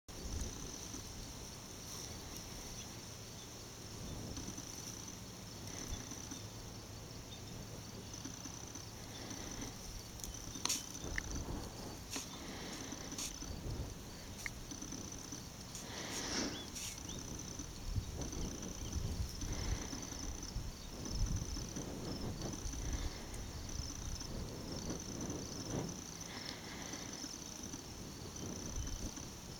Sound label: pups frolic, coyotes